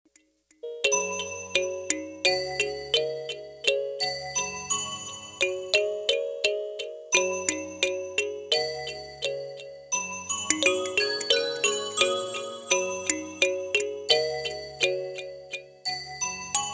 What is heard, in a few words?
Kalimba Morning Song